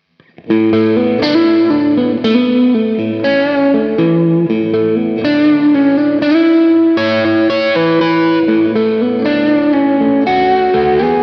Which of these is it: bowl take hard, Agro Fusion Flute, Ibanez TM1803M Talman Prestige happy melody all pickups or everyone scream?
Ibanez TM1803M Talman Prestige happy melody all pickups